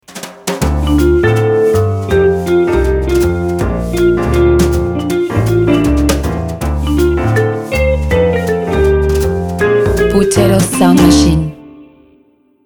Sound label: jazzy ident